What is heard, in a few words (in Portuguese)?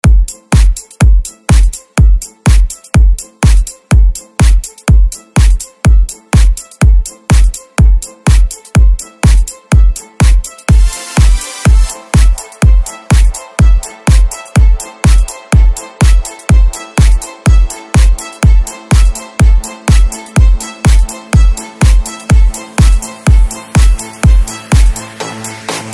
energy vibe eletronic music